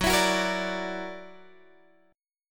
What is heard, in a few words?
Gb Augmented 7th, down, Guitar, Open G